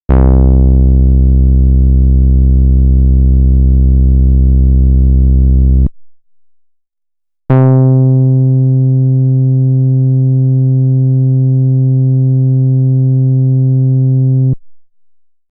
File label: Bass Dark, synthesizer